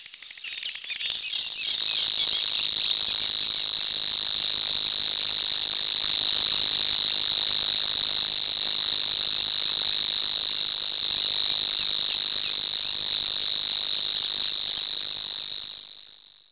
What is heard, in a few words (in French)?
Locustelle luscinioide